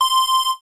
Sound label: start